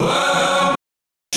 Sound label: Key chant; Luxembourg Zwee